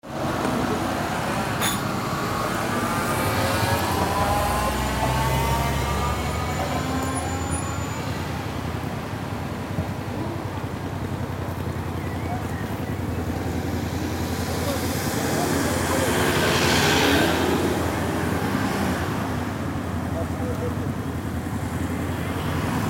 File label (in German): Strassenlaerm